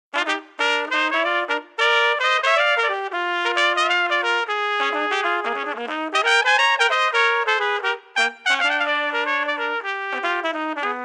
Jazzy Duet

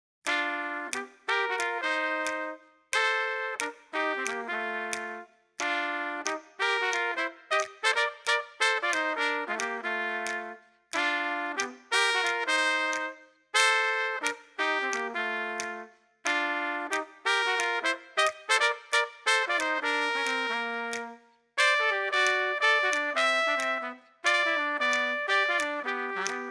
Jazz Duet

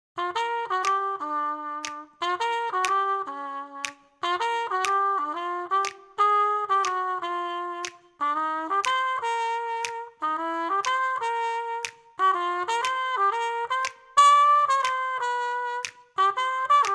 Blues

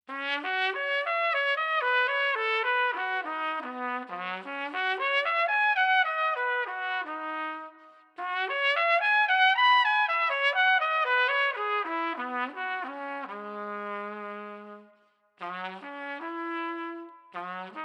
Trumpet Skills

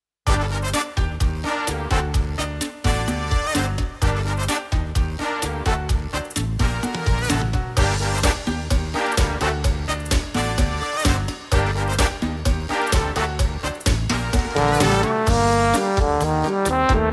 trombone

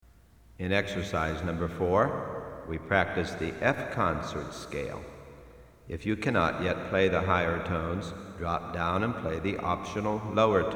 Major Scales Exercise

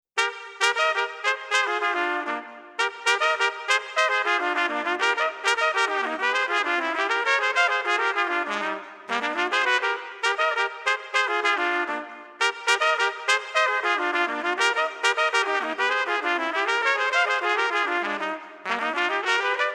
Duet

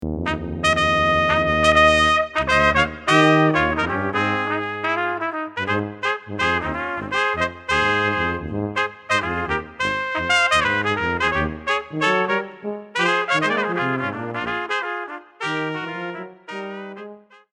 Jingle Bells

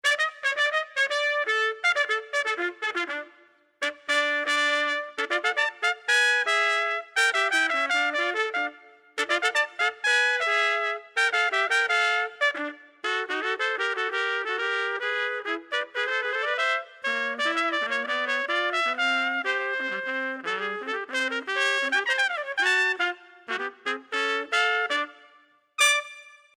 Rumba

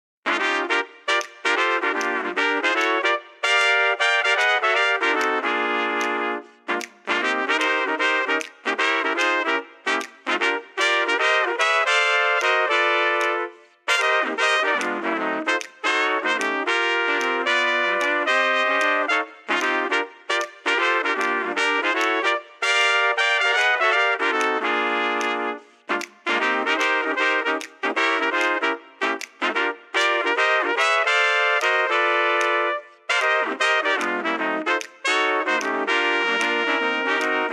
Blues

